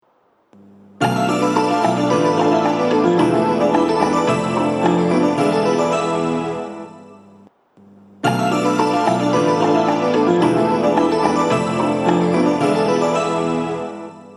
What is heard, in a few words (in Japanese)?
発車サイン音, BOSE天井型での収録です。; 1番線 西船橋・東葉勝田台・津田沼方面; 門前仲町